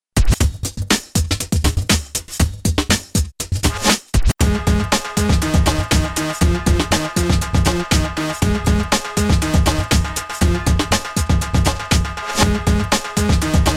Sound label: bed synth